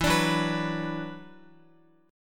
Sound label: E 7th Suspended 2nd, Guitar; E7sus2; strummed